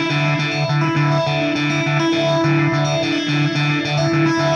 Arpeg Guitar; Session 02 - Clinical

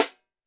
percussion, Roland TD-20

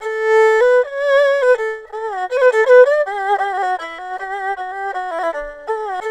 ERHU, 80; INIS - Opium

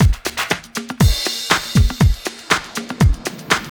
house loop

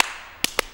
MULTCLAP, MULTICLAPS; Best Service; World Colours

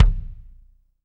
BD; Bob Clearmountain Drums I

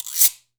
Guira Fast, Perc; Akai MPC500; LA Perc

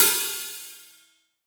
Acousti Hat Hit, hihat; ultimate hihat; Hits

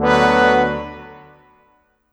Brass, Cinematic; Sonic Foundry (Sony Creative Software); Orchestral Series VOL-3 - Cinematic

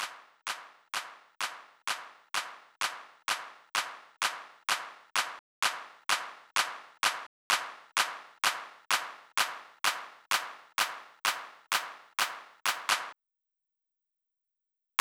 Clap, Drum Loop 128BPM; VEC5 Drumloops; VEC5 Multi Loops; Loop Elements

